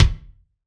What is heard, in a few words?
BD, BASS DRUMS; Drum Mashines; BASS DRUMS 1; EdgeSounds